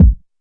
kick, Classic Hip Hop; STYLE KITS; Classic Hip Hop Mega Kit

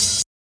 hat; Hats

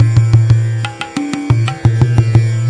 178 tabla and sitar; WORLD LOOPS

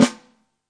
real snare; A Real Freakin Drum Kit

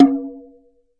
Processed Ethn Perc, Doru Malaia Percussion; ETHNIC and WORLD PERCUSSION

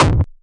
nasty stormfront; Nasty Stormfront Electro FX

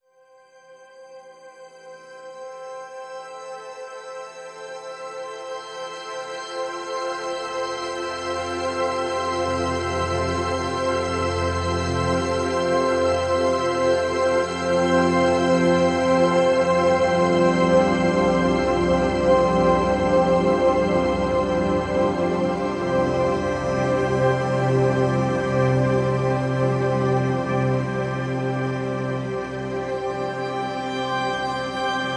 new age adventure; Pads

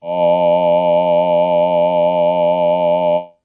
synvox F1, Synthesized Male Voice

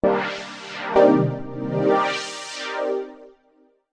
three part 7th pad; Pads

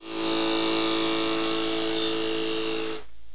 neon buzz; Various Strange Sounds and Effects

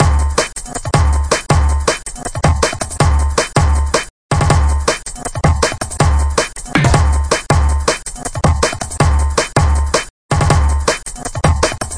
160 bpm rubberband jungle, drum loops and breaks; 141 to 160 bpm

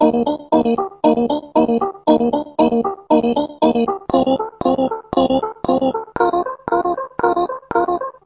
116 quirky synth choir chord melody; SYNTH AND ELECTRONIC LOOPS

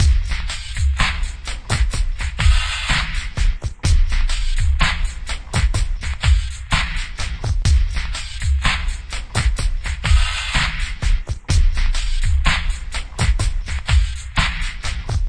063 bpm phased relax back, drum loops and breaks

